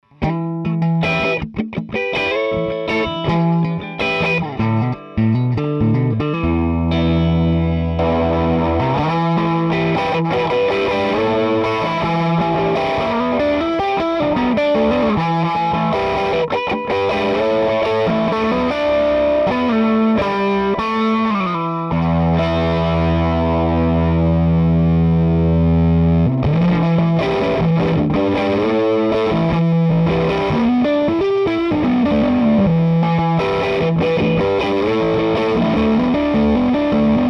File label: GT308b P416B Red Blue, fuzz